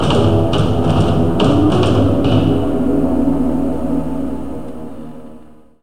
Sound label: Rare Spawn Loud